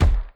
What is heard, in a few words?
Zombie Guard Footstep; gamerip